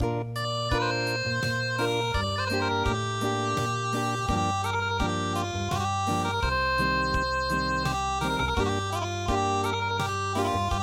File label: Ainu Song Unplugged